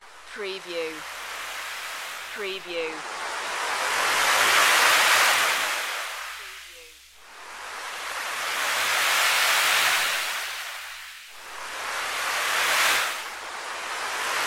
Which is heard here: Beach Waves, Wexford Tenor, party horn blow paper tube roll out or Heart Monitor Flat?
Beach Waves